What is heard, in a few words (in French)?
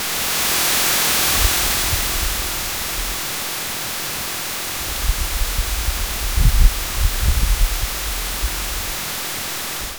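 fleur qui ouvre tranquille, blossom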